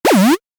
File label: Retro SFX, Retro Style, Harsh, Heavy; Tech; Stuart Duffield